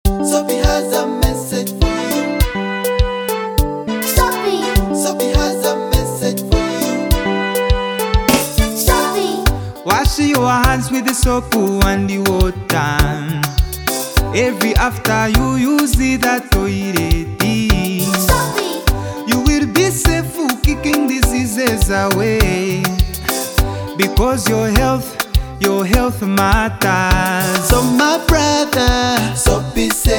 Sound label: Jingle, campaign jingles; Soapy Saves Lives